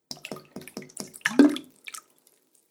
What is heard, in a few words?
Water Bubble Sounds; Water Sound Effects; Agcnf Media